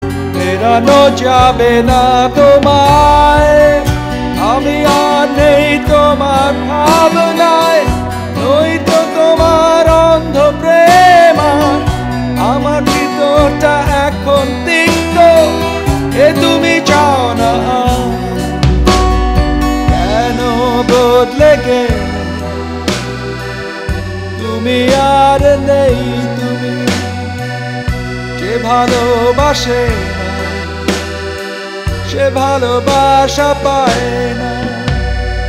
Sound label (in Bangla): Bangla Hit Song